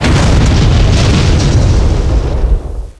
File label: truck death; 1997 MMX LAN PACK (win 95); Army Men